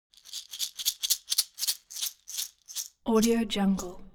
object shaker wood rattle dynamic movement; smartsoundfx

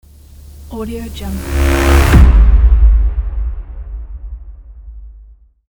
deep epic horn

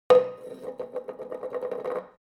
object plastic plastic fall

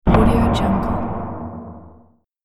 loud door slam

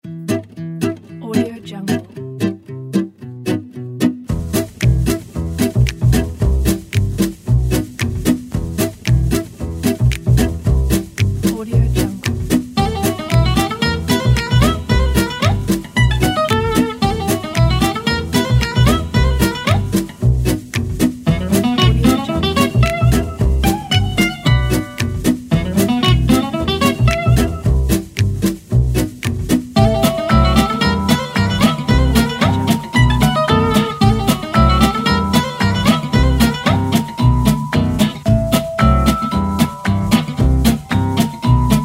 jazz cooking; maryjanemusicstudio

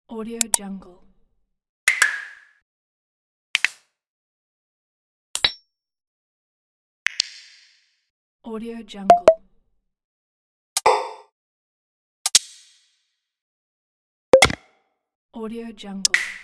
double click

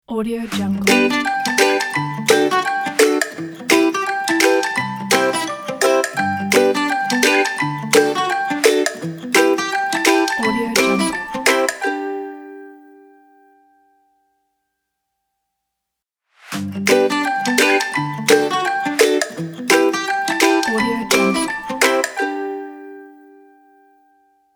fun acoustic logo ident

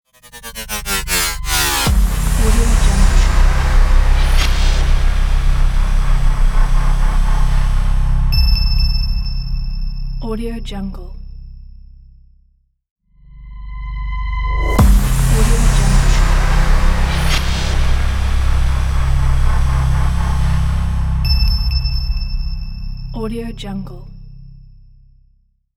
dark glitch logo; warmwolf

